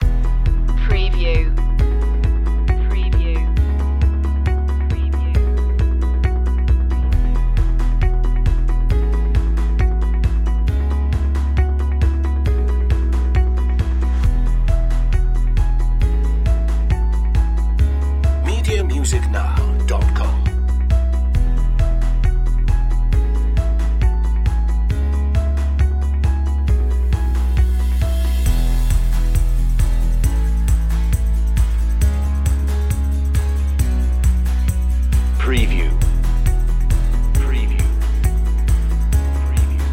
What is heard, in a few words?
upbeat impact